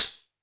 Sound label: technox tamb; Quasimidi Technox